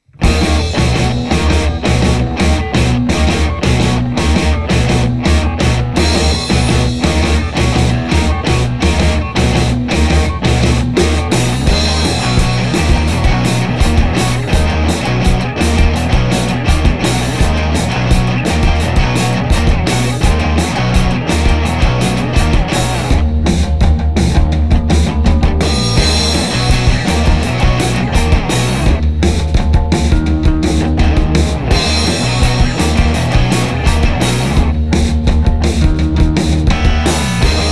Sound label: dust bowl